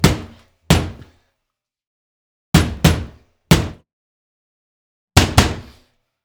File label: Slot Machine Vending Hits Angry Punches Thud